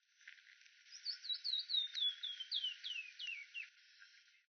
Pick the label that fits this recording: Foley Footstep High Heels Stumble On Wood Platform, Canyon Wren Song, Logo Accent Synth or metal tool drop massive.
Canyon Wren Song